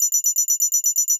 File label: breach warning beep; Counter Strike Model Pack; CS:GO; survival